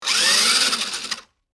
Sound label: Remote controlled sport car motor revving; rc toys